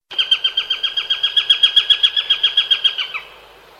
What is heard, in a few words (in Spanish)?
pajarito en la selva; animals